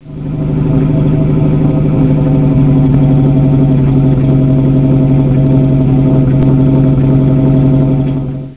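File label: rover 16 6 cylinder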